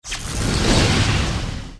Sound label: spell piercing shot cast; archer